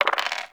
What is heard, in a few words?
Open Dice Movie; Cetus Vendors; Five Fates